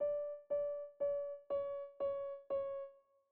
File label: Piano Microtones; Piano Nudes